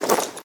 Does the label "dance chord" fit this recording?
No